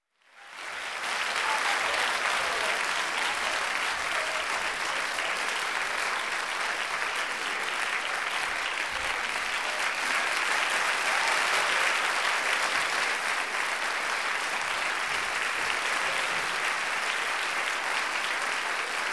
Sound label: Continuous Applause